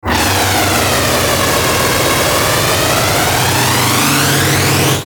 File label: Static Flange Ascend, Static Distortion Burst; Production Element Imaging Element Accent Transition; Blastwave; Static Sound Effects